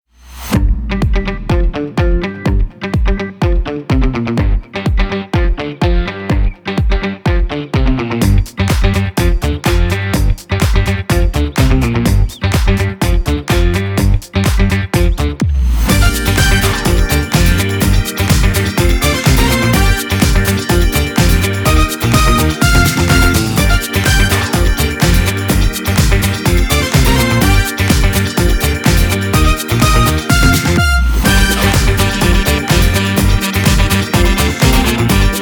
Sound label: funk mix; This Funk 125; audioblocks